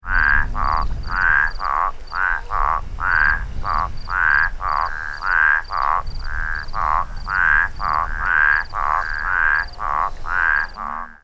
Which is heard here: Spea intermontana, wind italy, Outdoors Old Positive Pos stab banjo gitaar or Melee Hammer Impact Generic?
Spea intermontana